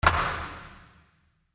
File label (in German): Sporthalle gute Akustik; Raumakustik